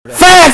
fax

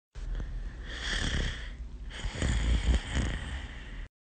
snore

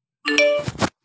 notification; weplay

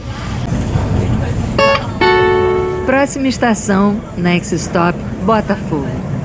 metro; botafogo

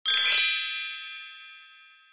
chime shot

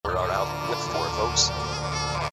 grrrrr

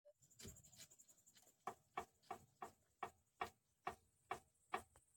control arm clunk

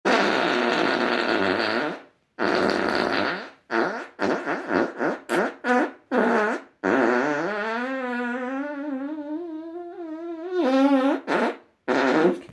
farts

